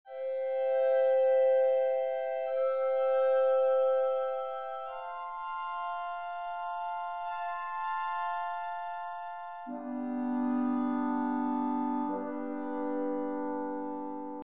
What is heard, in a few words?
chords